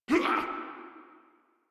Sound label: Pain, Grineer Male; Warframe